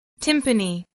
timpani

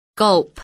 gulp

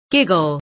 giggle

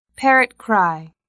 parrot cry

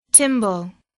timbale

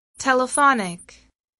telephonic

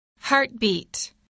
heartbeat

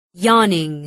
yawning

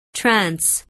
trance

